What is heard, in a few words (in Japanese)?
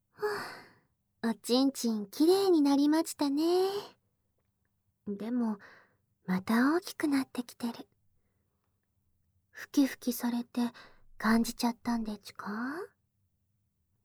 耳舐め乳首責め; お射精でちゅ♪; おっとり母乳ママ